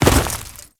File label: Step Ice, Footsteps; Warframe; Grineer